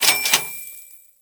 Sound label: Cash; Treasure of Nadia